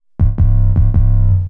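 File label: synth bass, drum and bass, 160 bpm, C; eJay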